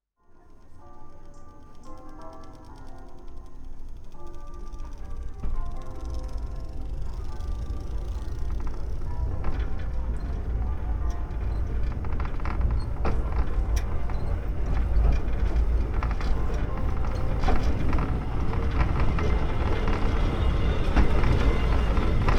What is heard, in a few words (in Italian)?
in transito Funicolare Locarno Madonna del Sasso; rumore lontano